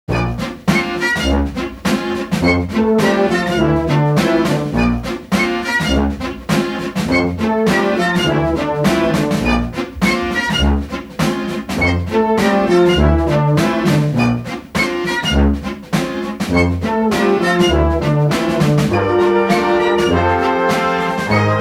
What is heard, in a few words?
Marching Band Version